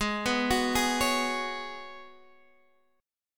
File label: C sharp 7th Suspended 4th G sharp